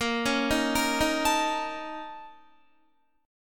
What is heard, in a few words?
Bb Minor Major 7th Double Flat 5th, Guitar; BbmM7bb5; arpeggiated; 17,16,15,15,15,17; JGuitar